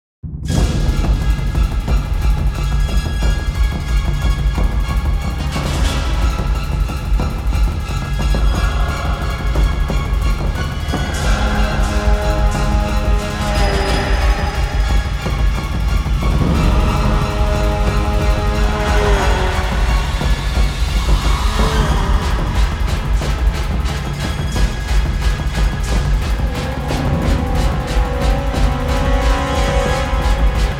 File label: Action 180BPM, Classic Horror Sci-Fi Music; Dead Ark